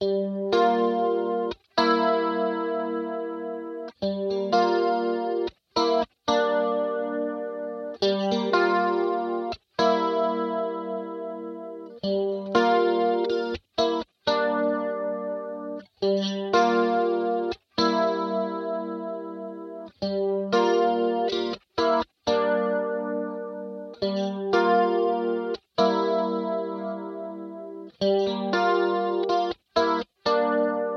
Electric Guitar, chorus

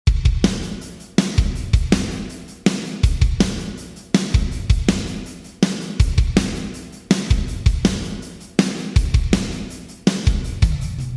Drums Yamaha SPX900 Plate Gate; Vintage Digital

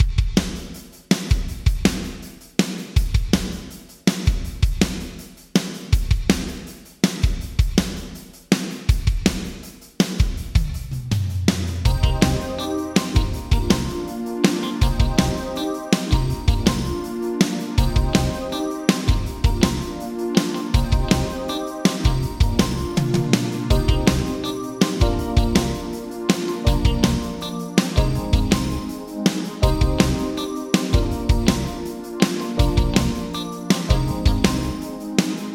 Drums Yamaha Rev7 Vocal Plate 1.2s; Vintage Digital

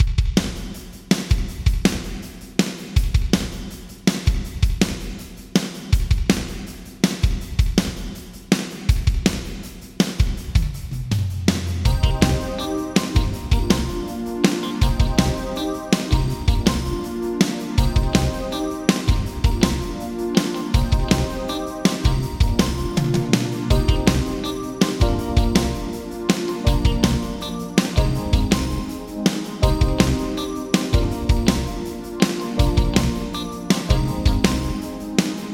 Drums Yamaha ProR3 Medium Hall; Medium Hall 1; Vintage Digital

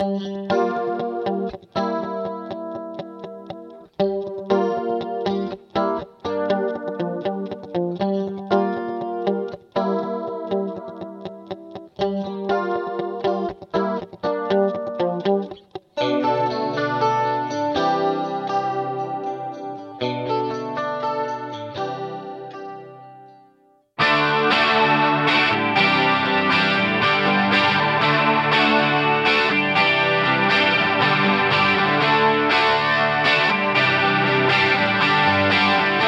Guitar Sony HR MP5; Vintage Digital

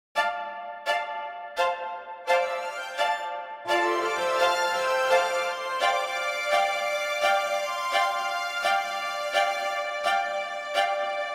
Strings, Notre Dame Reduced Decay

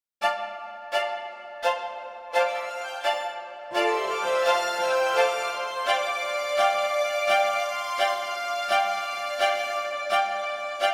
Strings Klark Teknik DN 780 Hall

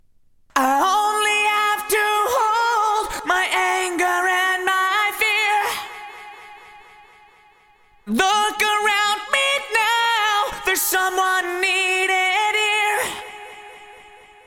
Vocal Dynacord PDD 14 Modulated Delay; Vintage Digital

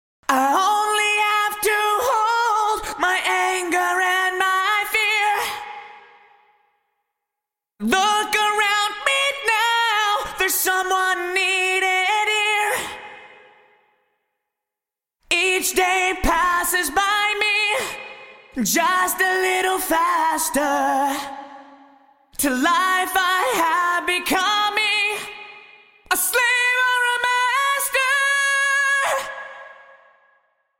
Vocals Bricasti M7 Deep Chamber; Vintage Digital

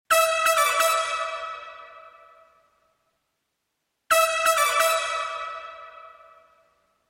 Brass EMT252 250; Vintage Digital

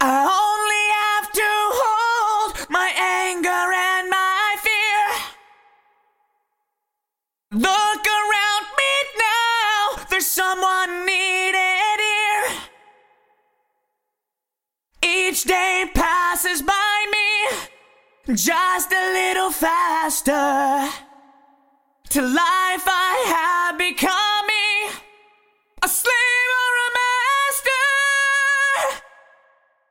Vocal Eventide H8000; Vintage Digital

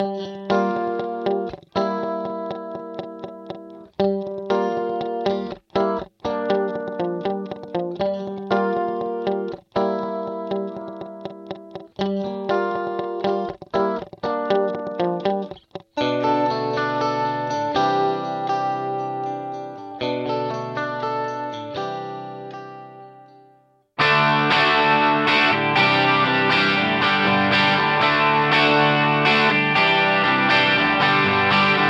Guitar Yamaha Rev7 Symphonic; Vintage Digital

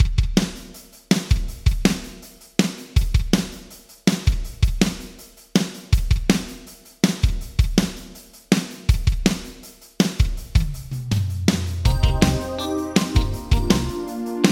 Drums Yamaha Rev7 Snare; Vintage Digital

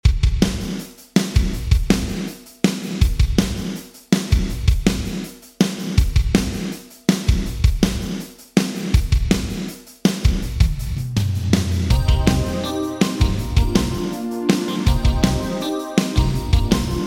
Drums EMT252 Nonlin; Vintage Digital

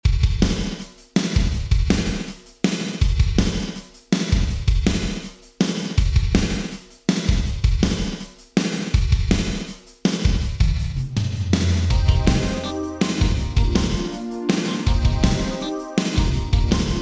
Drums Korg DRV 2000 Gated Reverb; Vintage Digital

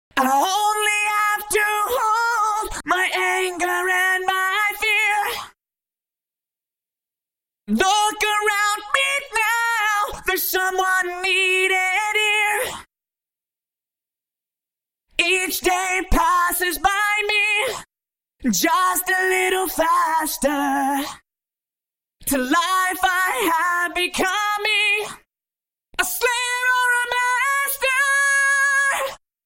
Eventide Instant Phaser Vocals

